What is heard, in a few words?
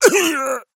pain death; Operative